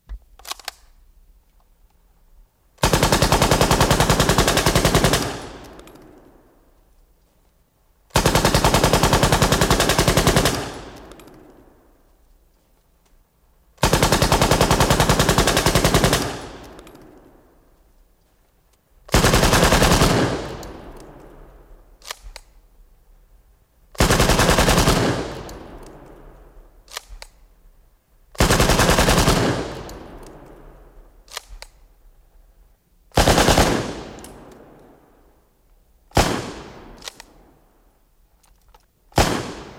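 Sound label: Machine Gun Cock Shot; bursts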